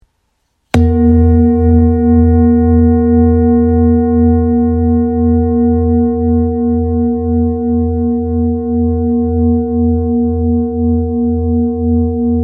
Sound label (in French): Bol chantant therapeute 2.18kg mi